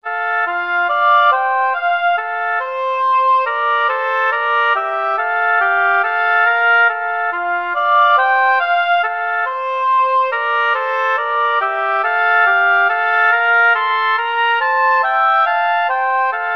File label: oboe duet